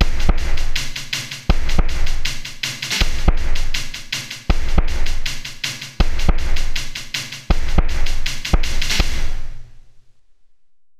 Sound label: drums fast